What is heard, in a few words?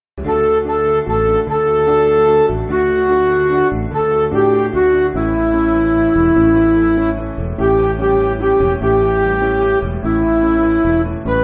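Music Backing Files for Churches; Up Calvary Mountain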